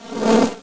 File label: Panel Buzz; Let's Discover Michigan!; Geo